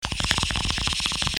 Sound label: bong